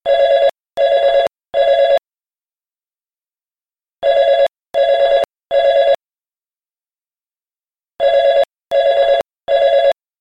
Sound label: Business Cell Phone